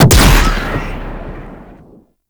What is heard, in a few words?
Rifle Shot Alt, Weapons; Warframe; Zariman Grn Wraith Rifle